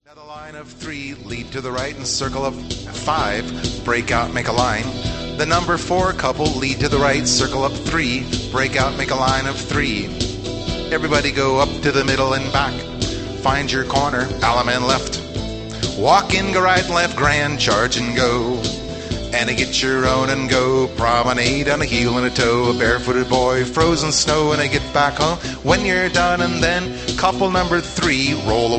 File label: Hoedown Patter